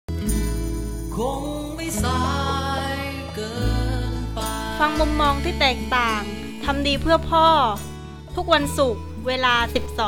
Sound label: for father jingle